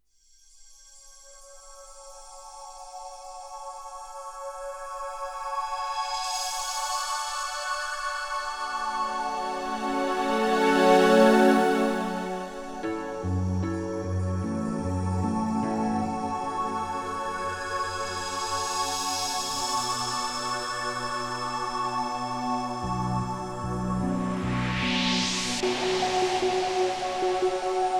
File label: milky way ambient space music